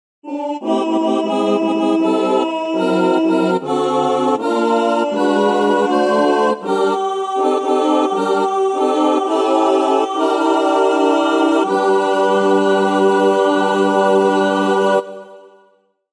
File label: All Parts, barbershop